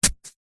household garden wooden sharp gardening tool stabs into grass soil single